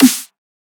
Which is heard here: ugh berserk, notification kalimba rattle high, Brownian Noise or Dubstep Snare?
Dubstep Snare